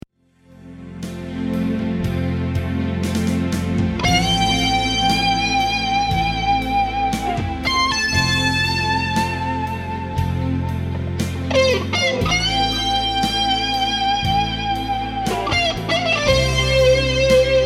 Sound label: TubeDriver HartmanFlanger TCNovaDelay Solo